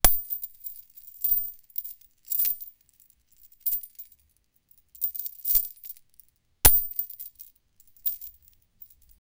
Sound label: metal chain breaks